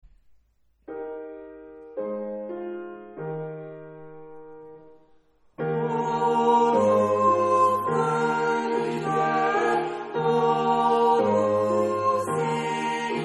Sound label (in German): Oh du fröhliche tutti